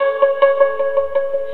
suntur, stringed instruments; Sonic Foundry (Sony Creative Software); World Pop

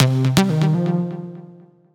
122 Cm Skrim, Melodic Loops; Electronisounds

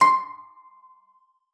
spinet; Xsample VOL-7

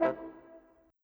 Horns, Orchestral; Sonic Foundry (Sony Creative Software); Orchestral VOL-2; Modern 12

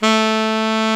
SAX A. MF A, Alto Short; Roland LCDP07 Super Sax

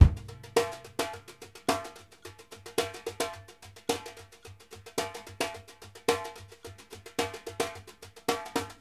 JUST HANDS, BRUSH 108; Sampleheads - New York City Drumworks VOL-1

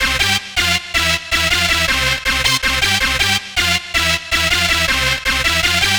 Dance Synths, Hardcore Loops, Synth Loops

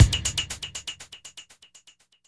END, Tekno Industrial; East West

